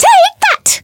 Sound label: attack; lele